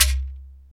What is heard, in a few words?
Afro Shakers, Shekeres; Roland LCDP14 Africa VOL-2